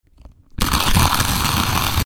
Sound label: Grind the coffee beans in